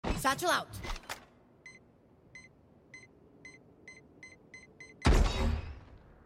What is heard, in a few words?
blast pack sound; raze; made with Voicemod